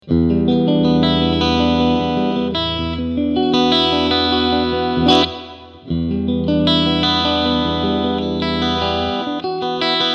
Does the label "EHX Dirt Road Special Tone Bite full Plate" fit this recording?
Yes